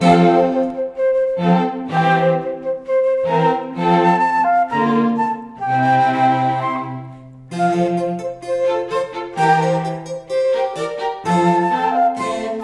Gameplay; Parisian Palace; Cooking Dash

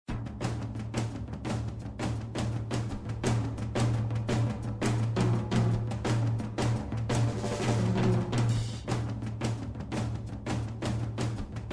Music drunken pipebomb

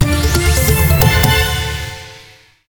Ending chime; Toto Temple Deluxe; Wii U

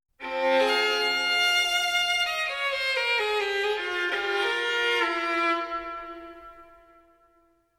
violin tuning; whisper of the heart original soundtrack

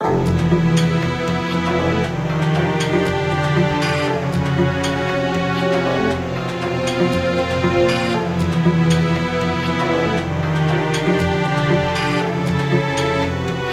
Dungeon; Infinite Links

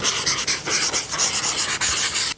Sfx Sign

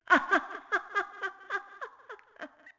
Intro Laugh; Levels Hub Restaurant; Intro Laughter Adult

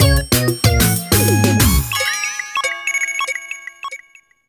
quiz Result; Taiko no Tatsujin Doka to Oomori Nanadaime 2006; PS2; Mgquiz

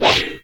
Sword; The Battle for Wesnoth

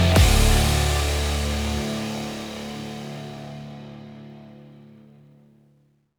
Forest End Fighting; Bright Memory

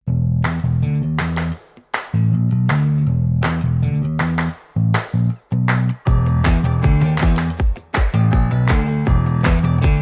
MAIN THEME LOOP; Trolls

